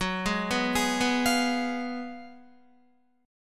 Gb Major 7th Suspended 2nd Suspended 4th, Guitar, GbM7sus2sus4 chord; GbM7sus2sus4 arpeggiated; JGuitar